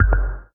One Shot, Hits; One Shots; Touch Loops; ElementsOne; Abstract Rhythms

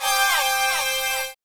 dance chord

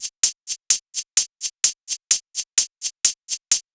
drum loop 128 Top; Anthemic

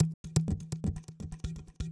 PERCLOOP ETHNO 125

